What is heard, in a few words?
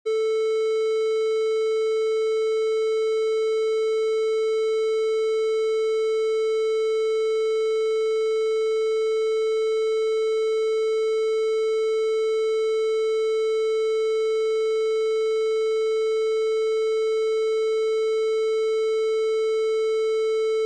Tuning Tone